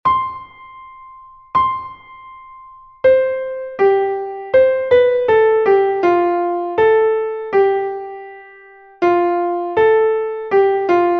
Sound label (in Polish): dyktando rytmiczne; Lekcja 06